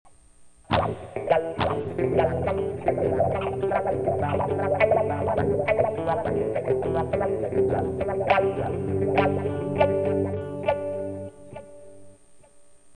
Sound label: two wahs